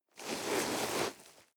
nylon leather soft

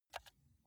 console controller arrow key press fast

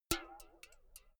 filled can hit ringing dripping long

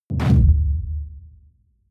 sci-fi underwater torpedo fire

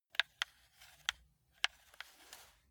console controller joystick move sequence

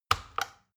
plastic button tiny click heavy slow

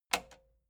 metal button spring click slow

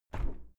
window plastic squeak open heavy

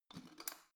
letterbox metal turning key

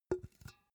empty can put down soft

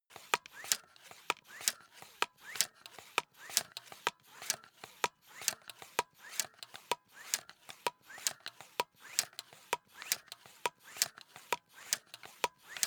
air pump small sequence

